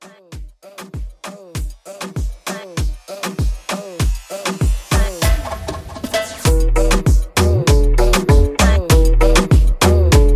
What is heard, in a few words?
Moomba Bootleg Reboot 98 CLEAN